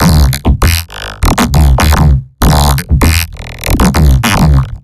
Beat; Mass Effect 2; Legion

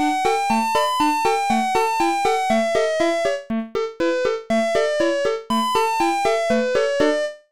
Jingle, frog intro; Frogger Helmet Chaos; nintendo ds

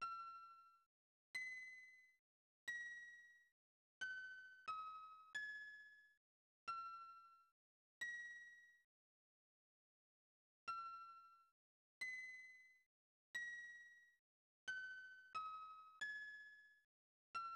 Piano Version; otogirisou; snes

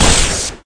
fire shock Pistol; SAS: Zombie Assault 4

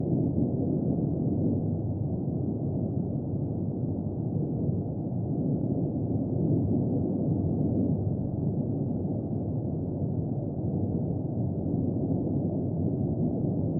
Wind Squat

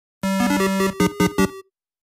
Warning

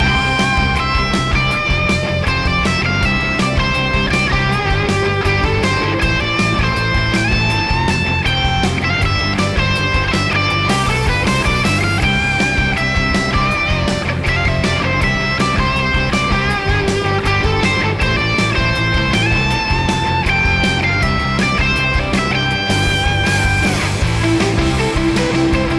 BGM Positive; Blade Strangers

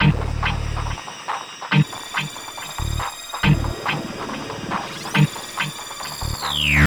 Low Groove 1; Unreal II The Awakening; Acheron

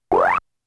Bite; Donkey Kong Jr.; arcade

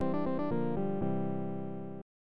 Unused Jingle; Yuujin no Furi Furi Girls; snes

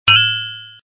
Electric Shield

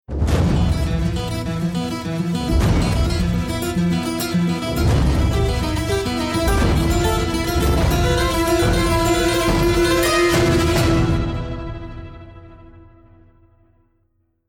bomb ten sec count

